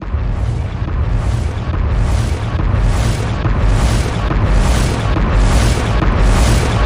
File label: Pulse Excavating